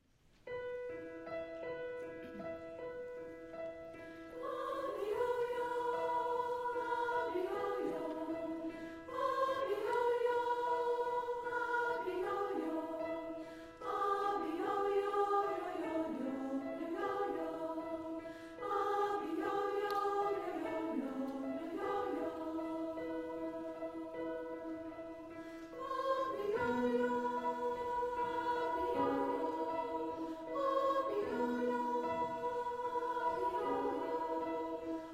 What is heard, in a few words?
African Lullaby